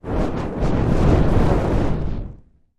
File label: Fire Ball